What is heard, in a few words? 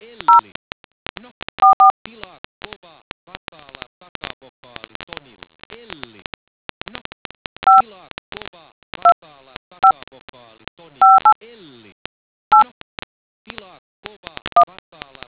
dtmf